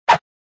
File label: Whoosh; Cars; tractor tip